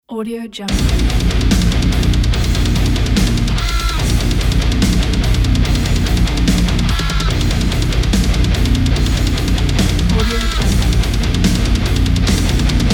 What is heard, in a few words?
bold metal trailer